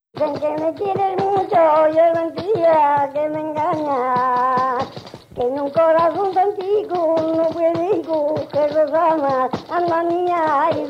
La jota y el mirrio de Tordehumos

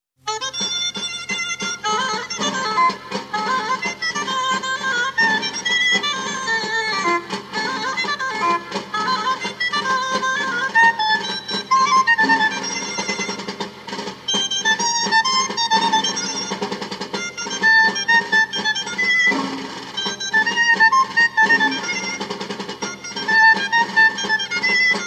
Jota de Iscar

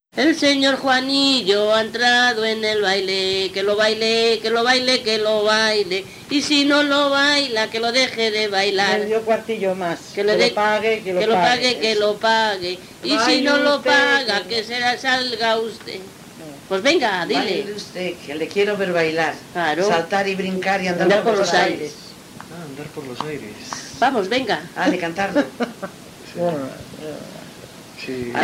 La jerigonza baile de entrar y salir, Medina del Campo

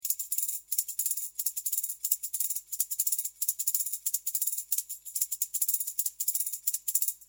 sonajas

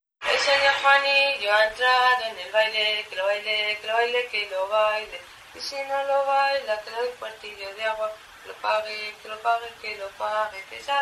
La jerigonza baile de entrar y salir, Medina del Campo; La jerigonza, baile de entrar y salir II

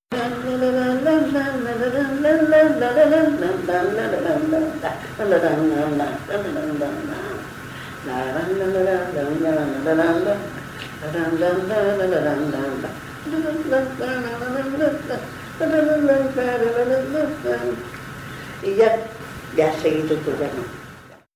Danza; Danza "La peregrina" de Montealegre de Campos

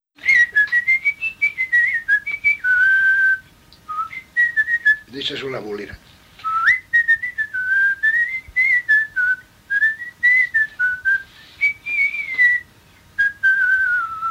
Las boleras de Velilla

